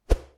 Swoosh

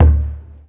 Pacific Island Drum

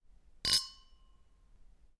Glasses

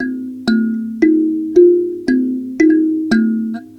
Indonesian Thum Loop

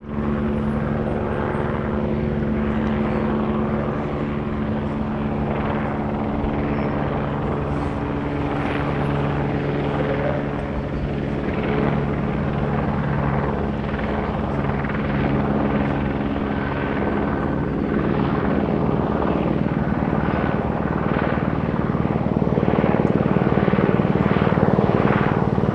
Police Helicopter Hovering And Circling Over City

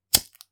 Lighter

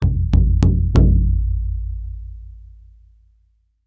Native Drum Quadruple Strike

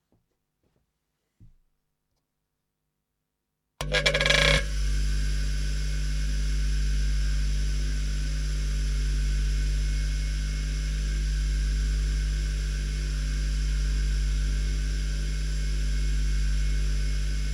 Bench Grinder

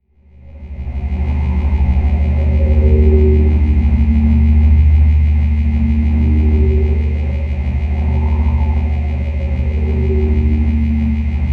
Spaceship Ambience

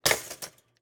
Toaster Pop

